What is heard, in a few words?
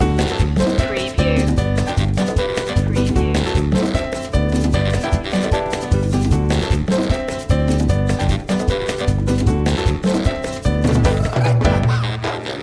merengue loop